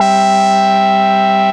Sawmill Exit, Bass, Synth Lead; Ableton; Creative Extensions

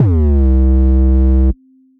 Amp Bass, Synth Bass; Ableton; Factory Packs; Beat Tools

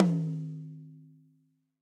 Tom High SteelyTight; Drum Hits; Standard Hits; Ableton; Factory Packs; Session Drums Studio

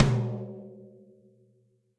Tom Low AmbientLoudPunch; Drum Hits; Standard Hits; Ableton; Factory Packs; Session Drums Studio

